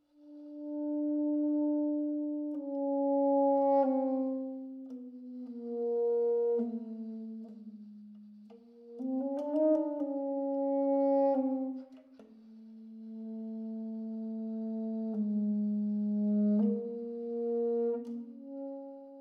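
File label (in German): sop, Subtone, Subtone als pp-Spiel im tiefen Register. Sopran; saxophon; spieltechniken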